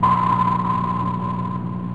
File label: ping; U-Boat 1.5.3